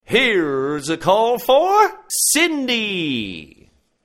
call for prayer; ringtones

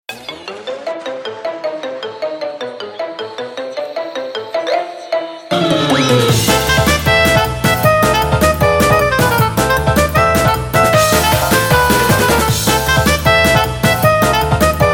FoxTrot

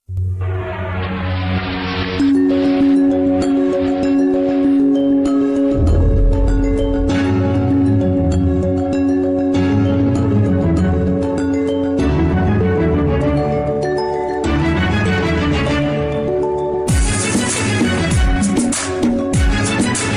Violin Solo

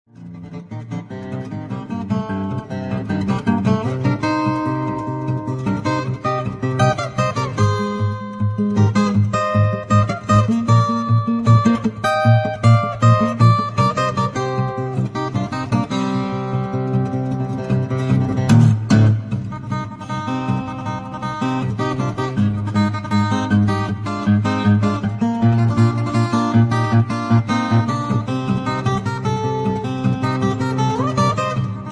solo guitar; mega man 2; dr wily

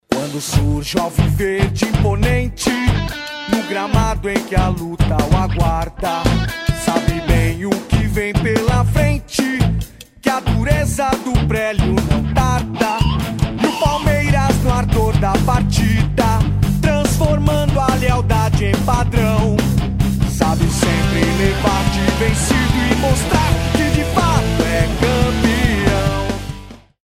Rock; Palmeiras

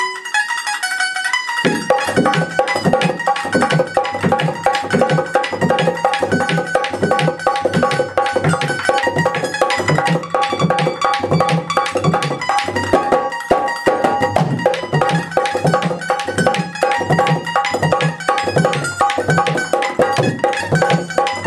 punjabi tumbi

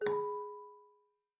Htc Sms; Lily

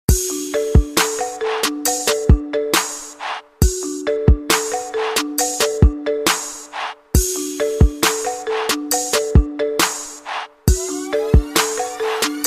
Remix marimba

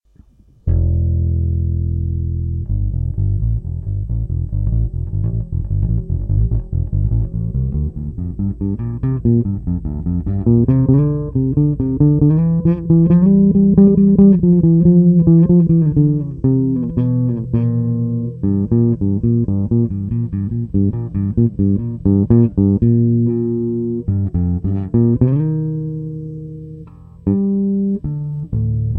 bass player

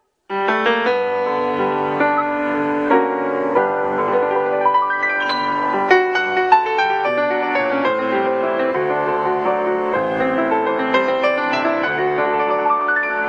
piano solo

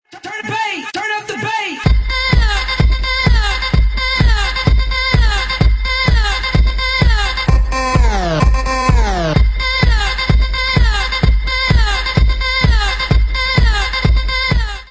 moombah remix

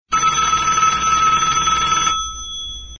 old fashioned phone; ringtones; sms

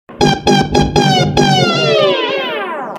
funny love msg; ringtones; sms

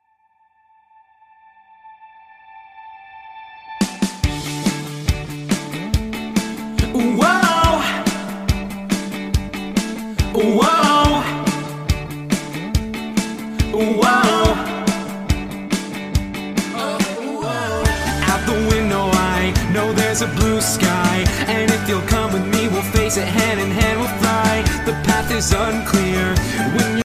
Vocal; Weirdmageddon

